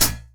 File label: hat one shot A key, hi-hat